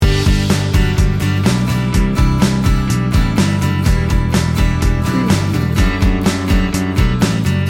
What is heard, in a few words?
americana soft rock loop